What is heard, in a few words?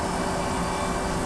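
elevator warehouse loop; doors elevators; Star Wars Obi-Wan; XBOX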